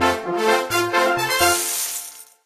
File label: bubble toprank; game rip; line bubble